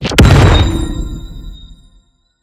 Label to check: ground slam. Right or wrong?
Right